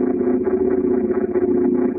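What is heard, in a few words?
Ambience Sci-fi Energy Loop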